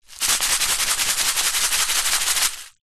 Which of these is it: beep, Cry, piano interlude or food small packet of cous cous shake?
food small packet of cous cous shake